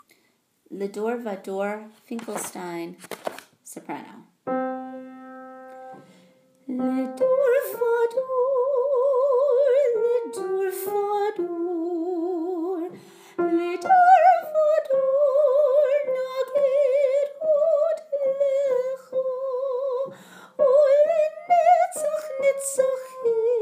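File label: L'dor Soprano; L'dor Vdor/Finkelstein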